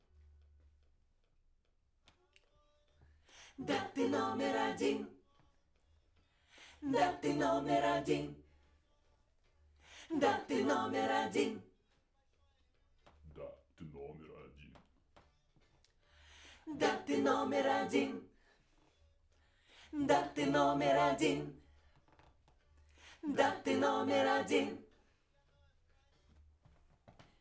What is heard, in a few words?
Backs SCHOEPS